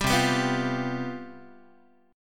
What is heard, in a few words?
C Major 7th Suspended 4th, down, Guitar; CM7sus4 strummed